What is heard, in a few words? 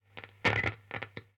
Crackle, Radio